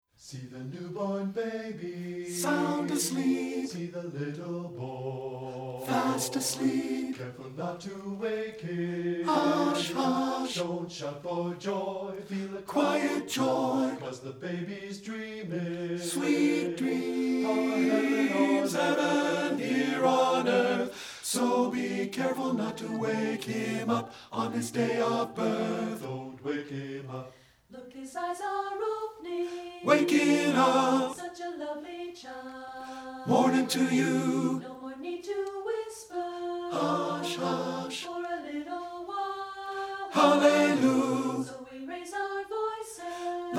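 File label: tenor; satb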